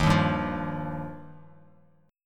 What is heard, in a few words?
D sharp Major Add 9th, Bass Standard; strummed; D#add9; 11,6,5,10; JGuitar